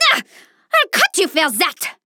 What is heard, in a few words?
Taking Damage; Paladins; Maeve